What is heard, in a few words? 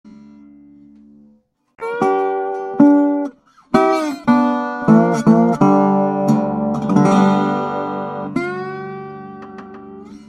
Tricone after slide